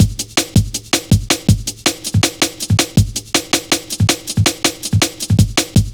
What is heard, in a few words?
Drum Loops, Jungle